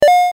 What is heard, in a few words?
coin